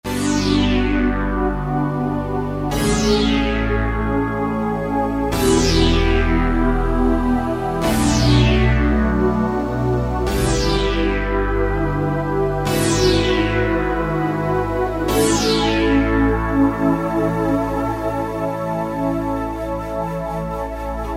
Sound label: Plethora, Alesis Z5 Vintage Synthesizers; Vintage Synthesizers And Classic Beatboxes